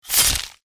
Knife Stab Single Knife Stab with Crunch

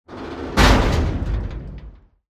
DOOR SHIPPING CONTAINER

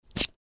CLASP PLASTIC

